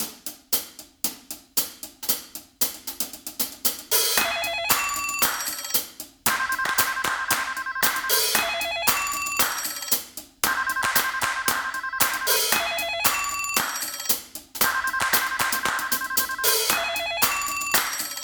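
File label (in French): Dring dring play-back, fond musical; Dire une comptine sur un fond musical SLAM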